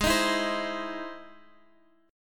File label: E Augmented Major 7th